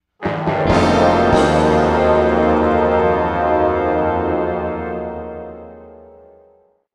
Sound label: Bari Sax